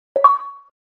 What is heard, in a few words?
minecraft notification